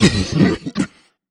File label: troll monster cough; Infernal Radiation